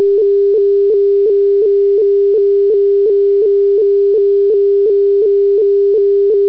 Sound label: irish message waiting